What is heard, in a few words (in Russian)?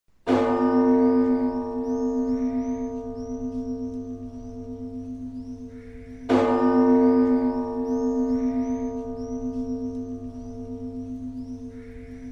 kolokol blagovest; virt-sad